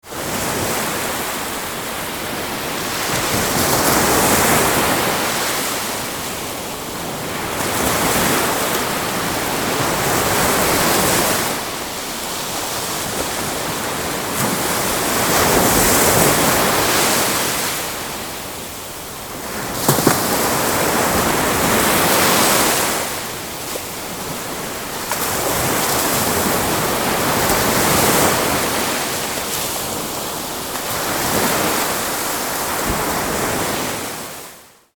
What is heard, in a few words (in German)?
Meeresrauschen; Der Klang des Meeres